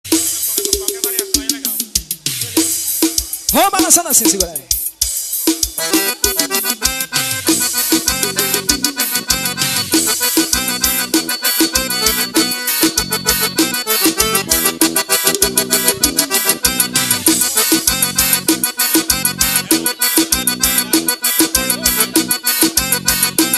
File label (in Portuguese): teclados forro